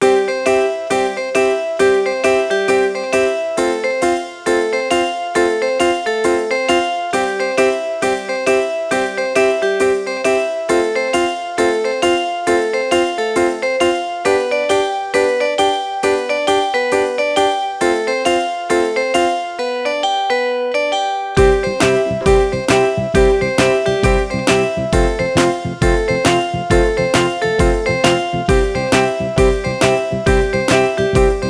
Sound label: happy day